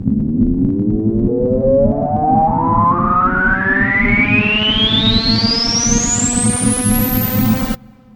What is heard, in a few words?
FX, Drum & Bass